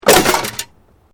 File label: Metal Light Movement Minor; Metal (Sound Effects:Materials)